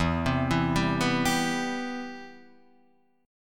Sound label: C Major 7th, E, guitar chord